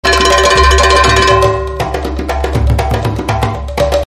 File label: cumbia tribal